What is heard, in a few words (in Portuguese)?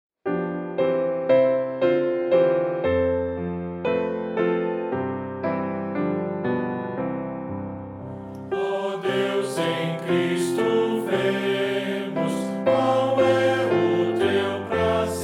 voz piano